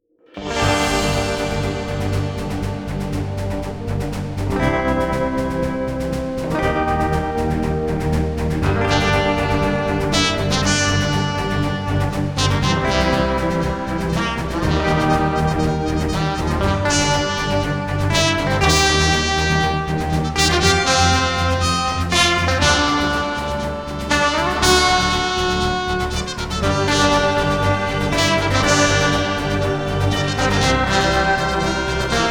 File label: Western